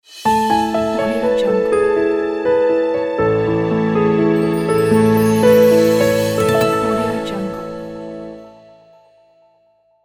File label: elegant piano logo